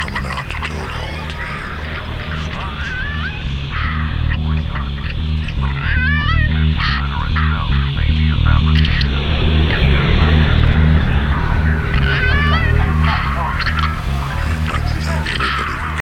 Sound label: loop; brainpipe